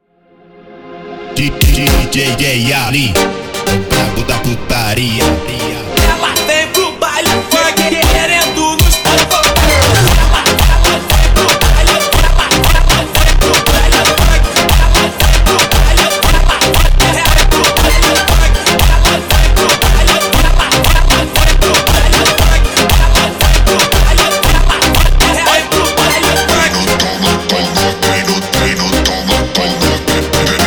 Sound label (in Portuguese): Montagem